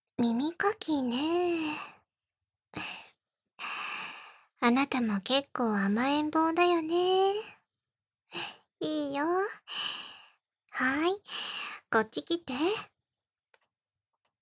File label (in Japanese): 耳かき